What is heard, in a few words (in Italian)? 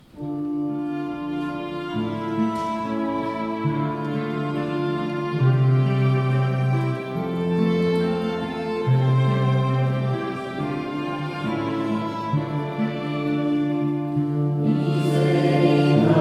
Misericordias Domini